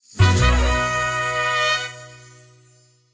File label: c win Medium; willyshotchillies